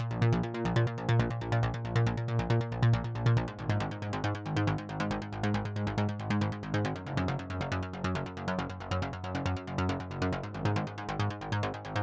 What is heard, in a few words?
MID BASS